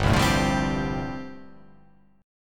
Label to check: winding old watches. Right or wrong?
Wrong